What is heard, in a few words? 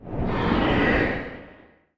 voice monster; maoudamashii